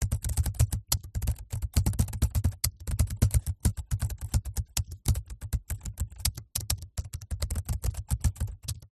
technology computer keyboard small bluetooth typing short key presses